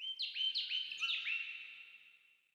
terrain Ambient birds

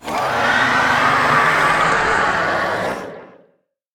intro roar; sfx; murglun

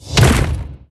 elemental area attack

